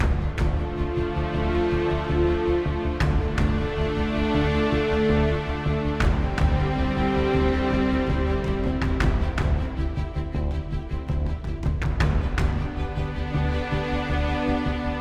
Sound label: Mood Mid